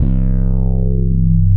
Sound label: BASS, Drum & Bass 160 BPM; Ueberschall; Drum & Bass Flash Trackz